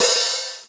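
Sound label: OPEN HH, DRUM KITS; InVision Interactive; 40 Oz Phat Slammin Hip Hop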